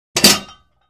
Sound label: Free Weights Exerci